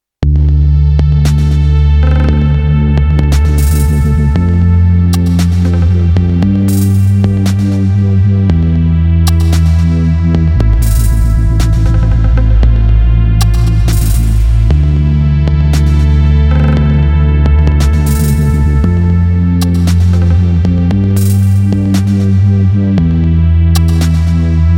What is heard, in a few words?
space ambiance